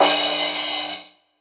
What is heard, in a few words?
Crash, Cymbals